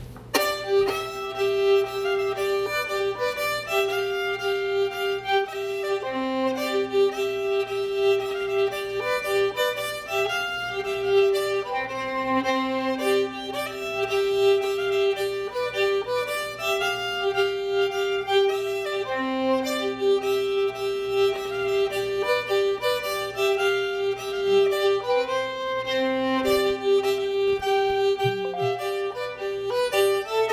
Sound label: waltz